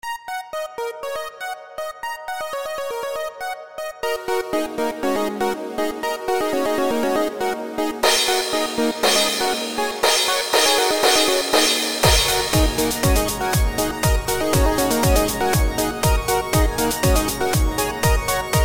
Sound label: Chiptune Test